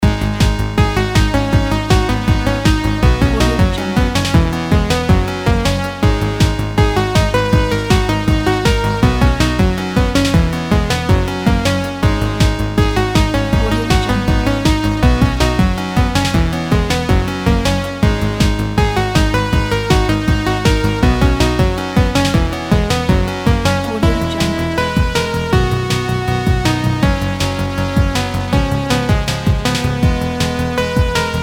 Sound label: classic retro game loop